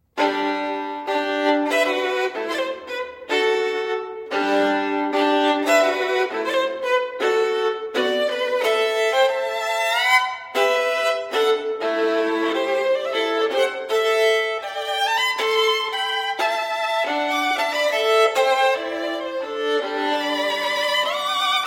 Sonatas For Violin Solo, Sonata No. 5 in G Major, Danse Rustique; Ysaye; Op. 27